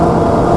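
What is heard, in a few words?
engine loop; vladimir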